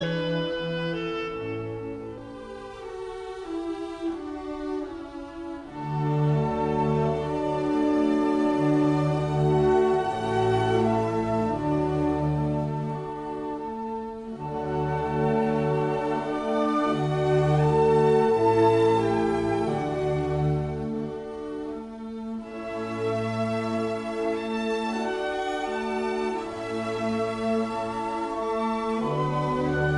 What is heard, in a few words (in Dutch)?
klarinet in A